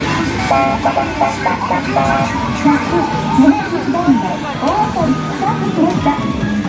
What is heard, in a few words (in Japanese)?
ファンファーレ